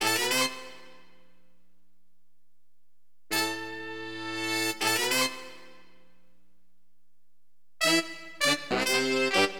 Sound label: FNK HORN, Wind; LiveAct Pool; AcidJazz Funk